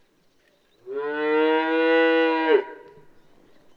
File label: cow calf moo